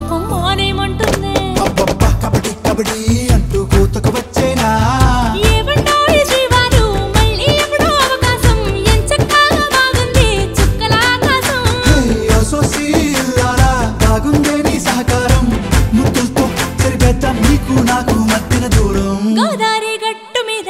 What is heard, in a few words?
RINGTONE, TELUGU SONG